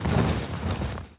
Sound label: wings; barioth; mh event